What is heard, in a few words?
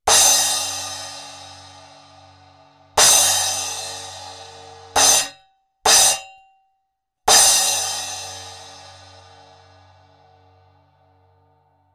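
splash ZILDJIAN K CUSTOM HYBRID 11, CYMBALES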